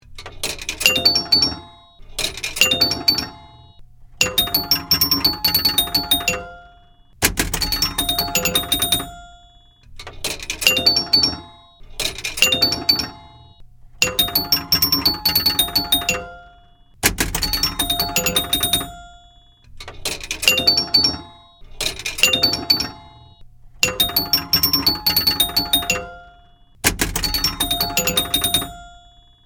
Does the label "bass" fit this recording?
No